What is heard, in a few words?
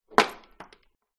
household door chain let go swing hit wall